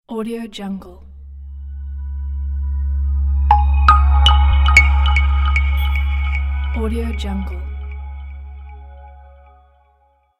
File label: clean logo